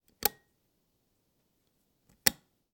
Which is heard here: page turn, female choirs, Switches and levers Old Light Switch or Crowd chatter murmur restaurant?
Switches and levers Old Light Switch